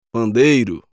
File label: Pandeiro